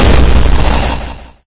bfg hit; cdogs; dogfights; map07

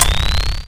mini cells pickup; cdogs

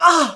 hit, pain; mohaa

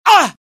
death, pain; mohaa; dialogue

